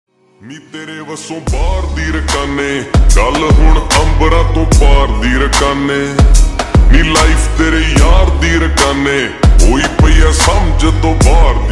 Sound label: Slowed Reverb Ringtone